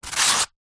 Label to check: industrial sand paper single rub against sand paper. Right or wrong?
Right